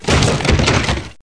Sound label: break wood; SAS: Zombie Assault 4